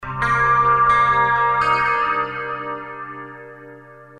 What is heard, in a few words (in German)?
gitarrenfill mit effekten